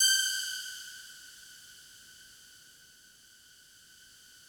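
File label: FOG ATTACK G5 Left, Yamaha VP-1; Music Store; 90's Sample CDs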